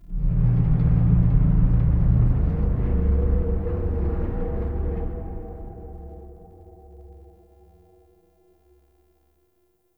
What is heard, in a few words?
Lfe Metallic Boom; Warframe; Entrati; Dante Unbound Leverian